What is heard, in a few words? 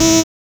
Buzz Angry; FREE SFX PACK VOL 2